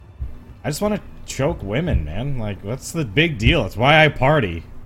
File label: choke